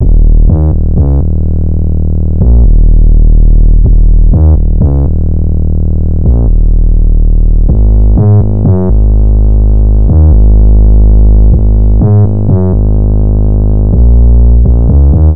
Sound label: Synth loop 125 Ebm, Synth Bass Loops; Slate Digital BASSHOLE Sample Pack